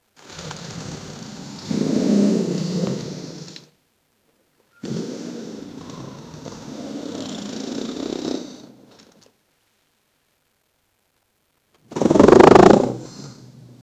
big anacondas sounds; SFX Engine

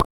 very soft bubble pop click

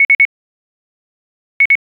electronics

